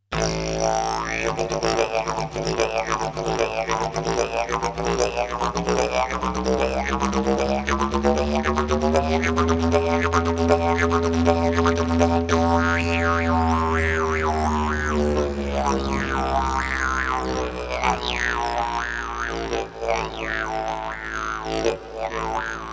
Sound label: slide